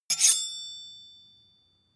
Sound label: sword blade grind short ring